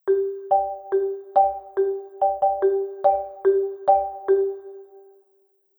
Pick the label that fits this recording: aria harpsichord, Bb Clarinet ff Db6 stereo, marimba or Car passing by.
marimba